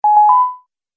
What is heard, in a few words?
Multimedia Event Notification, beep sound effect